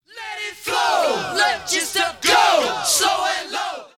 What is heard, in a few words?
Vocals ('let yourself go')